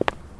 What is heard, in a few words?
Pavement